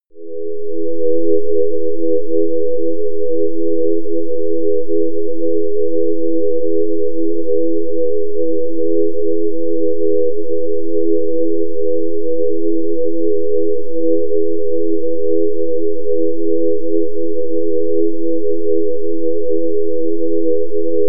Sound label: air bell